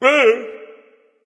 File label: surprised, voice; Smite; ymir skin12